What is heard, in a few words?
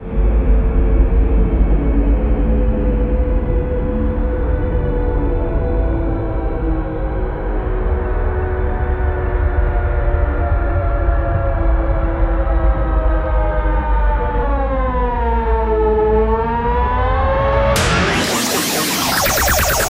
long sirene war; alert